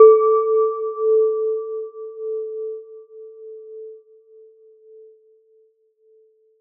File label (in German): glas synth